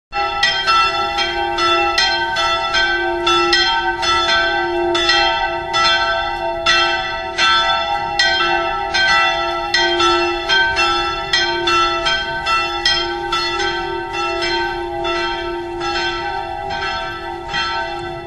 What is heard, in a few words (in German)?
Gelaeut; Völpke